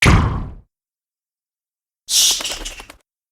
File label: bat; betty